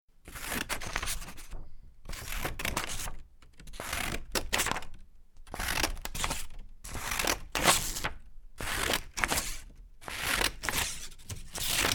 Paper Cutting With Scissor, Multiple scissor cuts in paper